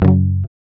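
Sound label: GUI F#, acoustic guitar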